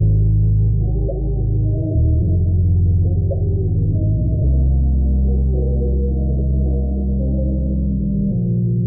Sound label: bass, Loops